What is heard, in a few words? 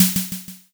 snare